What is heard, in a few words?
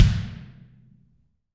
BD, Real Mega Drums